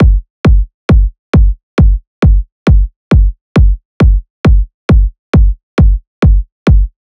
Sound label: Kick Loop 135, Techno Drums